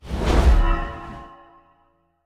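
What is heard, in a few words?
magic enchantment other, weapon enchant; skyrim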